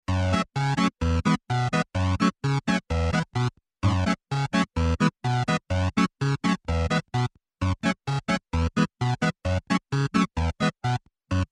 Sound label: Dance Theme, Game